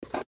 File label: Pen Pick Up Wood Desk; Office; Blastwave